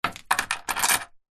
door chain lock; household

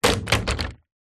bottle plastic full drop ground

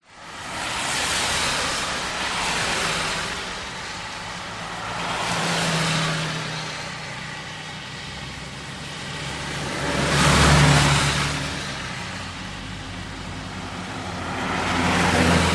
cars pass rain parked car from open window; transport

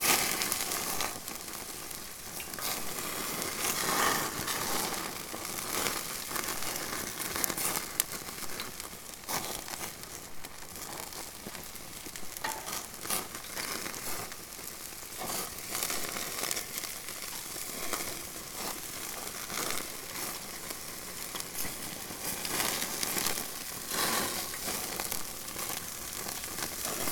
food cooking burgers on bbq sizzle; sound effects one

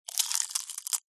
food egg shell crack break crush; zapsplat

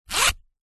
wallet zip

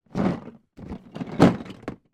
toy duplo large box tip movement

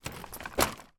household pushchair folded out open up

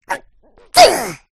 cartoon character sneeze; zapsplat

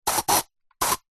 household deodorant spray

